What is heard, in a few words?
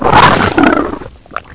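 alien grunt die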